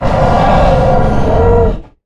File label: Harpooned; Evolve; Goliath